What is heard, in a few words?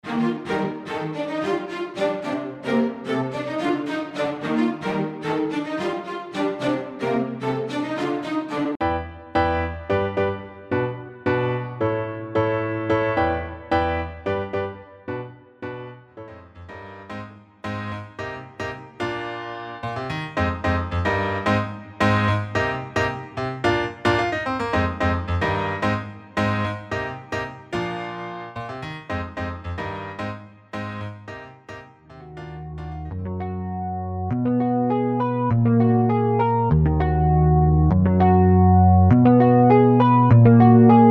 Piano and Guitar DJ Mix Loops